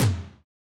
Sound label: Tom